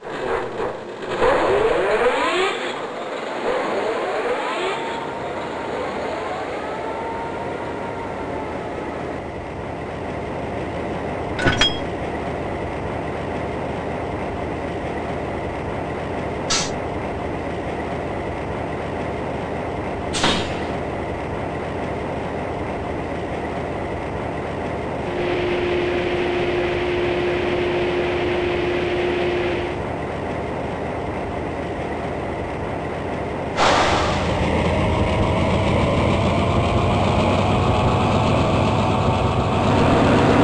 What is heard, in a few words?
DDA40X; US